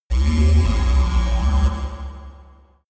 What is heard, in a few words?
space alien construction machine; aliens; soundpool